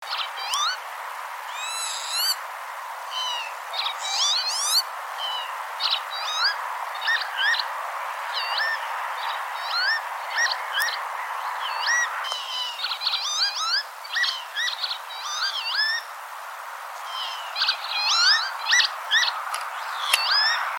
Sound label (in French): vanneau huppe vanellus vanellus